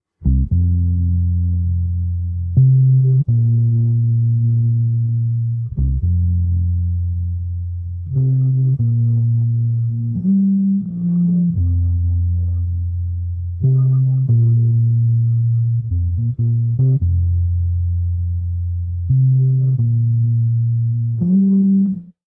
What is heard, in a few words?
bass; Chaos Is